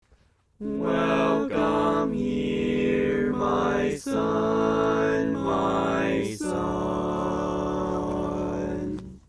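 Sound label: Baritone, barbershop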